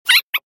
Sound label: clock